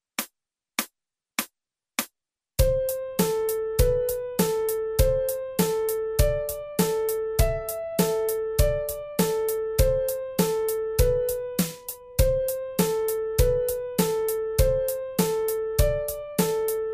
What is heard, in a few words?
Piano